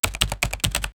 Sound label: keyboard